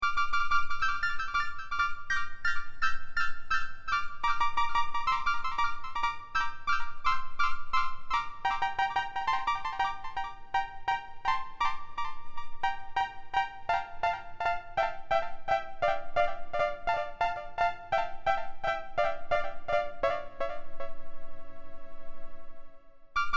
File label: e310u lead; Chorus